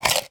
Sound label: ice chunk movement in plastic bowl; sound effects one